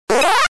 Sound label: Squashed Monster; arcade; Dig Dug